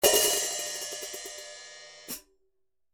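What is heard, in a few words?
hi-hat, ZXT 14 Rock Hat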